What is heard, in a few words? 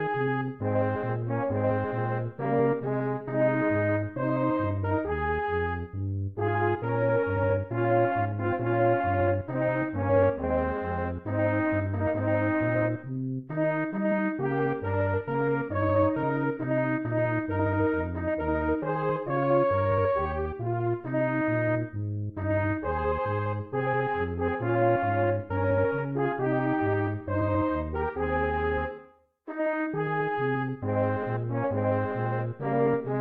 Instrumental; Der Panzerlied